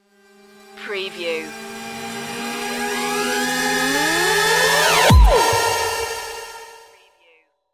what da pluck stinger

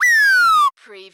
CARTOON WEEEOO

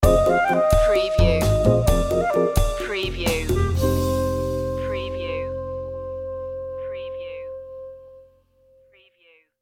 sting

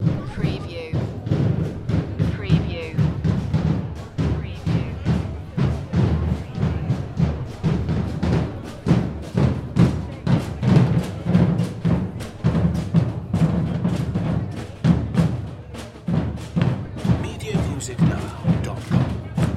Street Festival Samba Drums

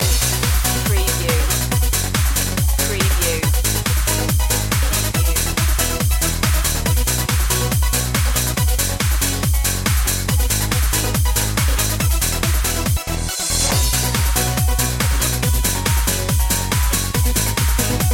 swirling beats loop

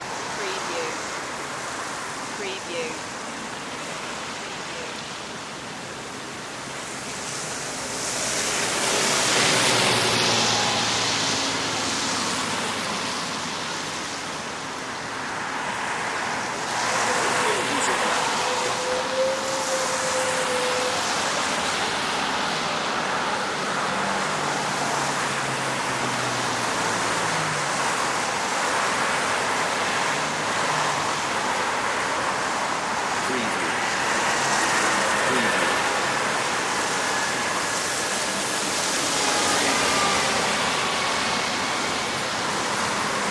city traffic after rain

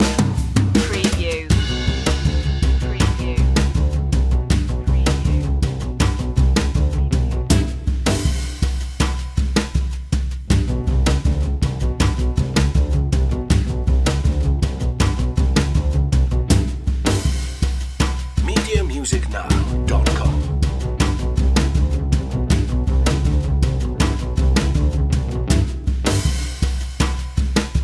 fast and jazz underscore

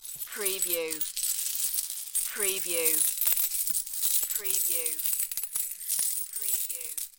Continuous Rattling Of Coins

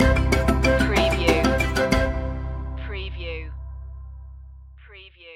african spirit stinger

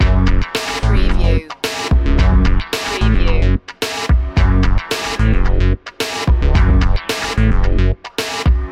80s cop funk loop

